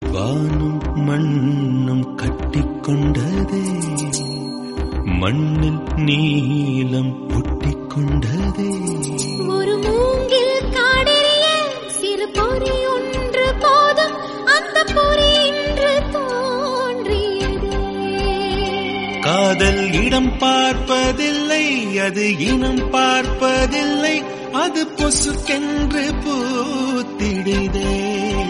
Tamil Song Ringtone; Kaadal Mannan

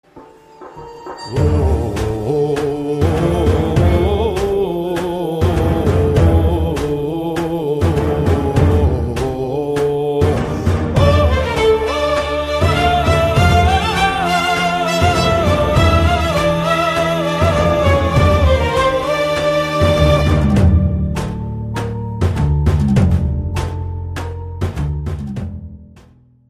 BGM Ringtone; Vada Chennai; Dhanush

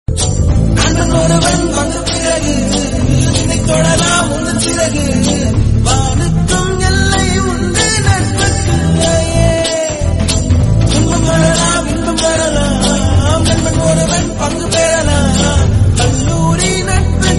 Hiphop Friendship Tamil South Indian

